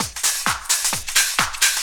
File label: drt 130 addon, DRUMLOOPS, LOOP DANCE